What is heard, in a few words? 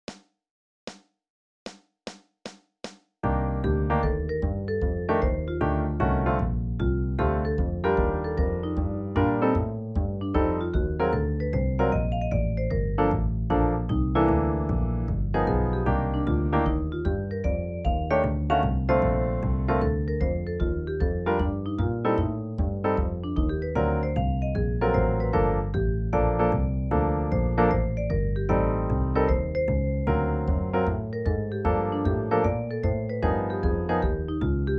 Etude no drums